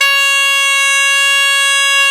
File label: CHANTER, Bagpipes; E-MU Formula 4000 Series Vol. 4; Earth Tones